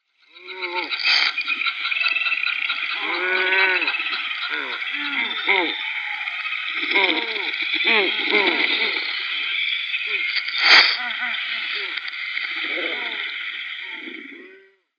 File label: Cape Petrel